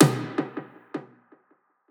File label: percussion one shot; Pop; Drums; SD PASADENA Pop Sample Pack; Slate